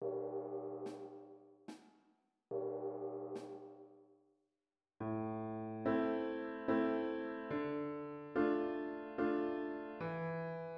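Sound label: Deep sorrow accompaniment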